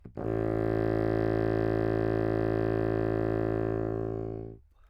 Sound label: low, baroque bassoon, Anechoic; OPENAIR